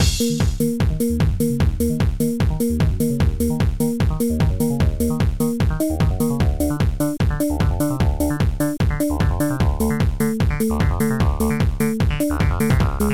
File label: bass test; A New Adventure